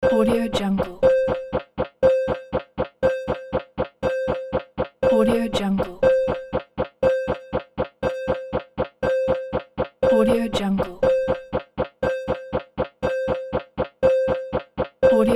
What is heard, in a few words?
counter for games and more